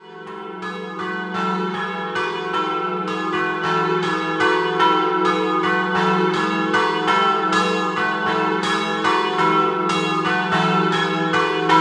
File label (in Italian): Valeriano, campane